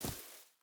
footstep rubber boots grass run; Rust

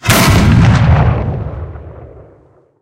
cannon 88mm Pak43 shot

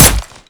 padding small

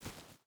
footstep barefoot gravel walk; Rust

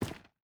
footstep boots stones run; Rust

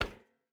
footstep hide metal run; Rust

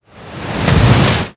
wave crash hit; Modern Warfare

